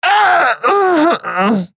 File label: Warg Death